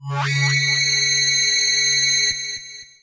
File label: beam